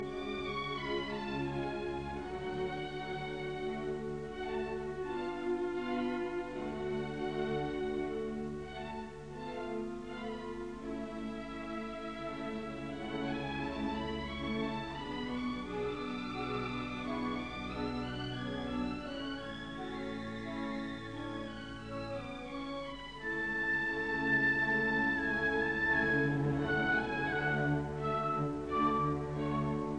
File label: Symphony in D major Classical Larghetto; Prokofiev; Op 25